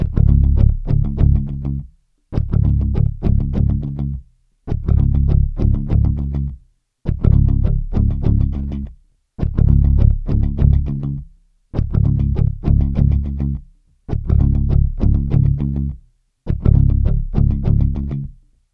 Bass loop 102 Db, Electric Bass Loops Direct; Slate Digital BASSHOLE Sample Pack

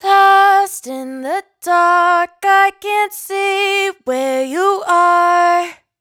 hook 148 Fm, Dry Vocals; Raw Vocals; Lost In The Dark; Slate Digital Omnivox Vocal Sample Pack

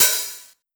Open Hat, 909 Open Hats; Slate; Vintage Drum Machines Volume 3 909 Edition

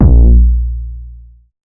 808 one shot Bb; Slate Digital BASSHOLE Sample Pack

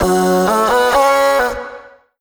phrase F#m, Vocal; Slate Digital Omnivox Vocal Sample Pack

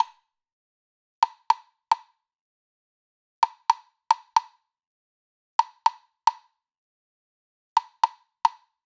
woodblock loop 110; perc construction loops; SEISMIC Drum Sample Pack; Slate

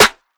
snare stack, Hip-Hop Sample Pack, Drum One Shots; Drums; Slate; CAICOS Hip-Hop Sample Pack

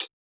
hats, Pop; hatsoff; Slate; SD PASADENA Pop Sample Pack

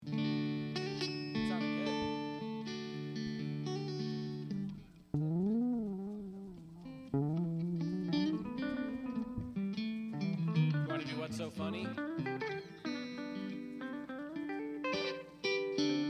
Strings Mix

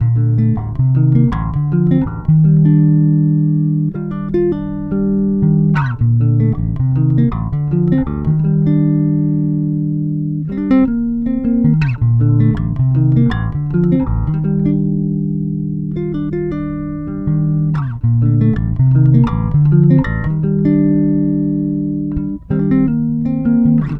Bass loop 80 Bb, Electric Bass Loops Direct; Slate Digital BASSHOLE Sample Pack

